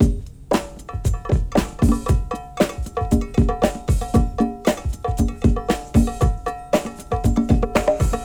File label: Conga